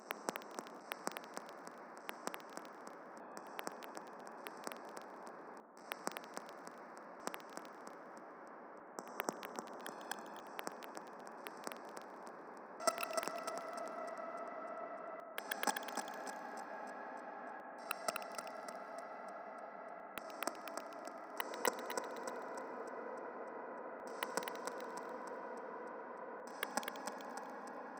clickity clack clicking; M8; percussion and foley

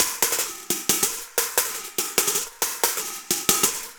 DRUMS SAMBA

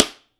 STICK, Drum 1 Dry; East Collexion

